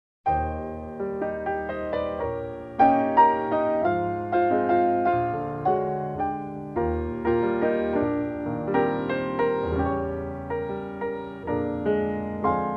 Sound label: PIANO VERSION